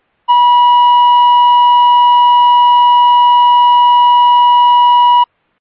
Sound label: Euro Toxic Steady Horn 969Hz